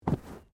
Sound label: Bodymovement Fall Light